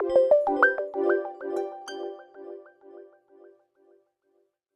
Jingle Finish No Record; super rub a dub; ps3

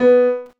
VOC PIANO; gamerip; PS3

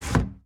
Cupboard Slide In; Lethal Company

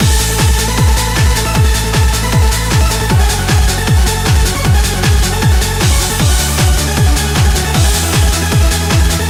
Trance Music Select; beatmania IIDX 18 Resort Anthem

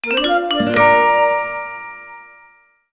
Win sound

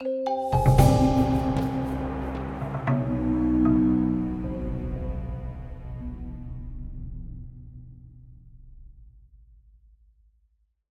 Death Stinger; lego horizon adventures; Aloy

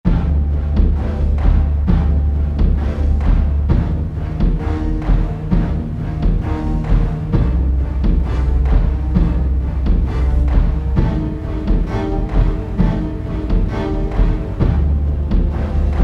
Music Boss; Eko and the Bewitched Lands

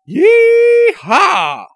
"Yeehaa"; Miner Max

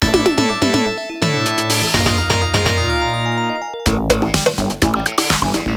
Horror Thriller Set A Intro; Deathwish Enforcers; PS4, PS5, Switch

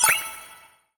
Multi Standby Ok

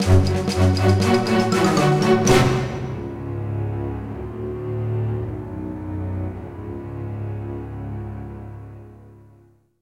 Bosscombat Lose; Bosscombat Lose Ch02a

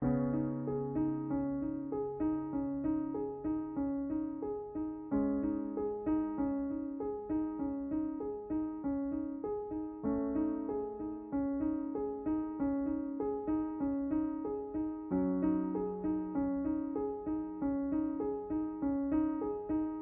piano guy melody

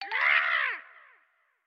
Skeleton Wind Up

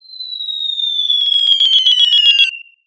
Enemy Projectile Tell

